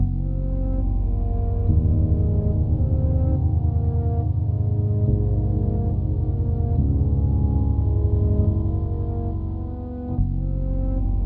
Bgm; Mangrove Forest

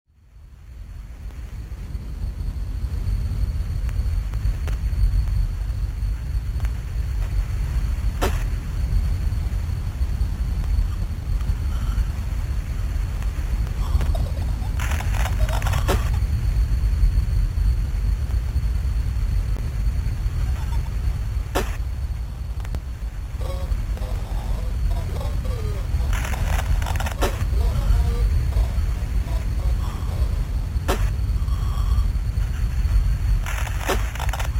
noisy

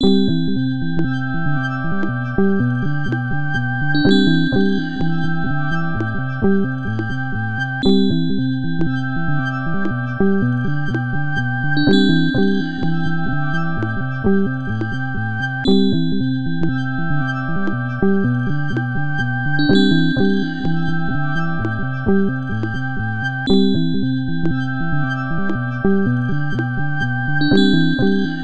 Bubbly

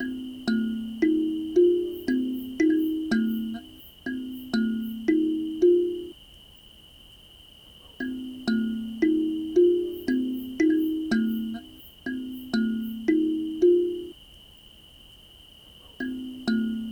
forest loop

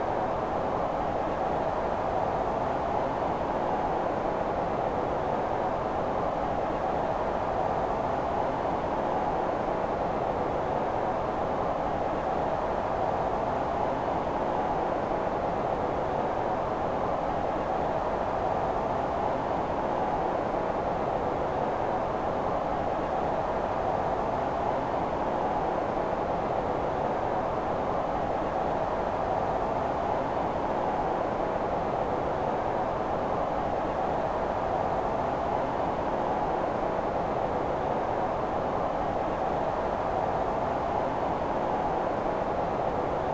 Wind (no rain)

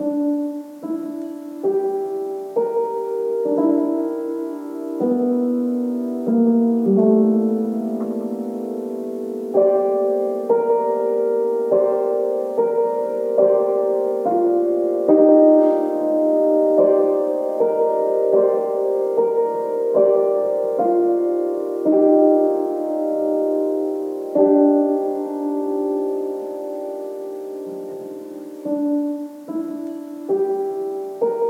Blues

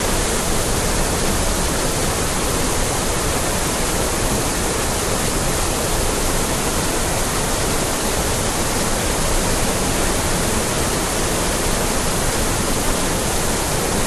heavy rain loop

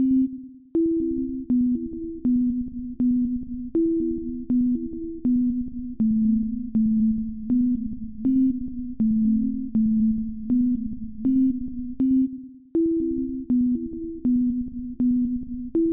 slowed

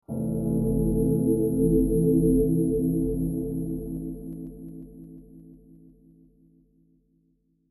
bgm

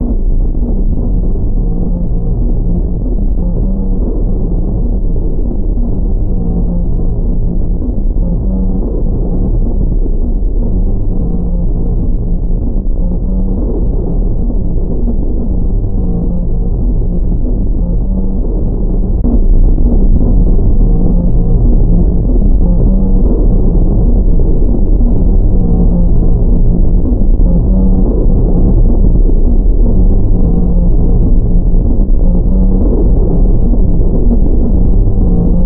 forest scary slow

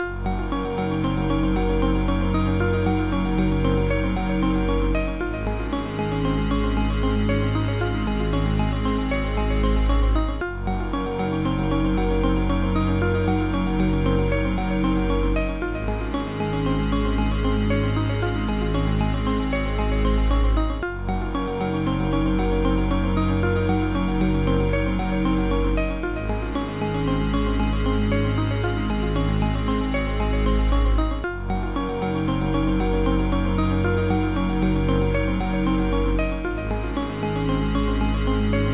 ice 8bit

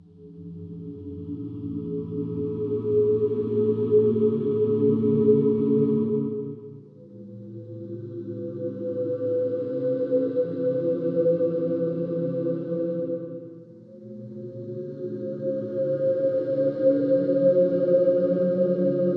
Bottom Choir slowest

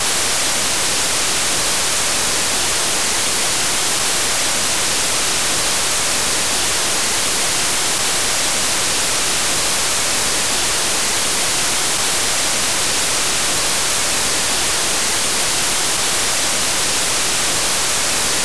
noise bgm

